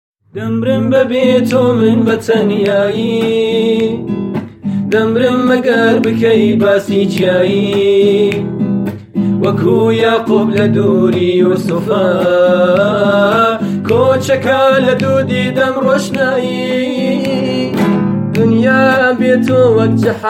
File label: Kordi